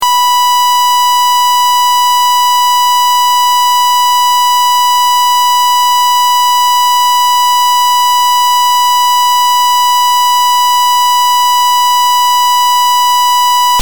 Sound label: rectangle 1000Hz stereo; 10sec; 6dB; Sine; RectanglWave